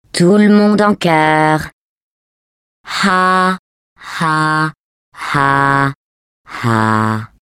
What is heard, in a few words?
laugh, fr; GLaDOS; taunt